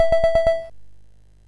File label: sid MOS6581R2; California Games